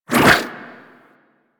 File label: Long Melee Spin Whoosh; Warframe; Weapons